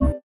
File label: invalid keypress; ChromeOS Sounds